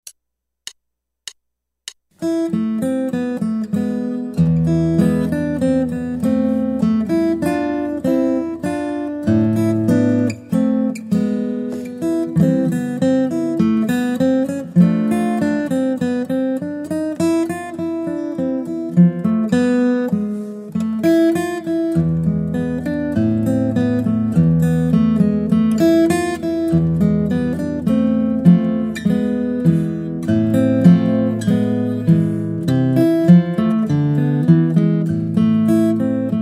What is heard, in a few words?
Guitar